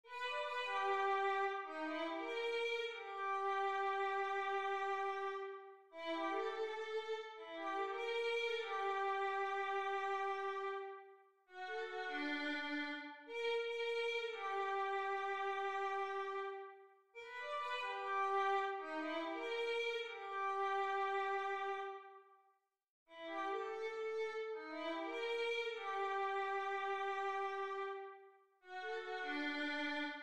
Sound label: violin